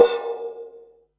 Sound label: HH, Pearl SC-40 Hihats and cymbals